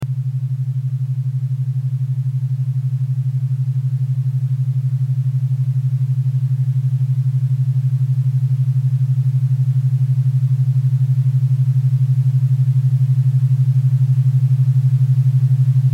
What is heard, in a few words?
alpha 10hz